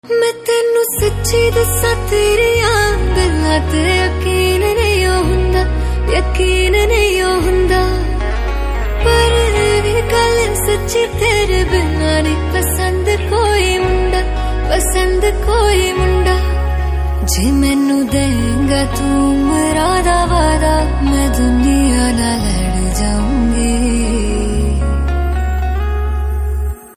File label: Female Ringtone